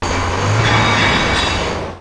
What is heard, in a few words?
stadium